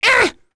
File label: Damage, Vox; en; Lakrak; kingsraid